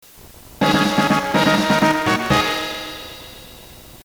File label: Fanfare